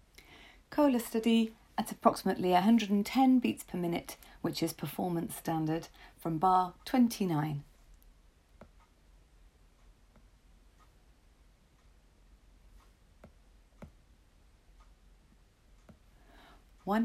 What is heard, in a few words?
110bpm; Kohler